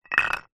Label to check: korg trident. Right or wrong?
Wrong